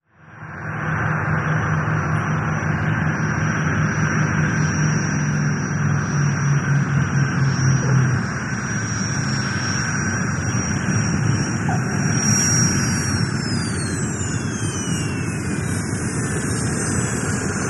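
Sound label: Prop Airplane Landing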